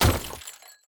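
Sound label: Crystal Barrel Break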